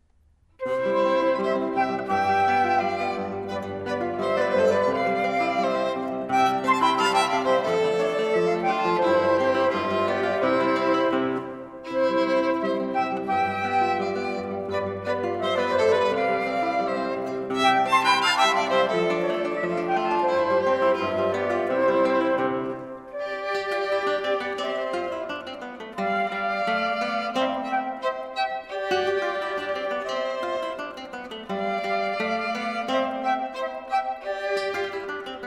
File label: flute cocktail andantino from trio